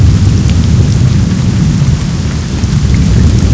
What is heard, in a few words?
Storm